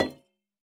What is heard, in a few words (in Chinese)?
铜灯 放置声